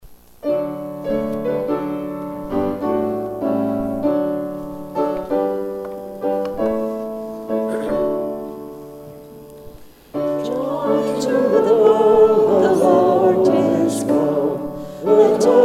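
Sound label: hymn applause; nativity; Hymn 320 'Joy to the world'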